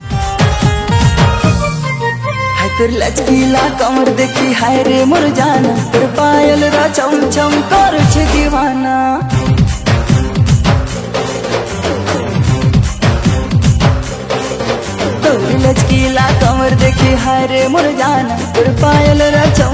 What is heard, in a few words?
Sambalpuri Music